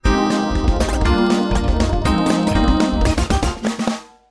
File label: Pop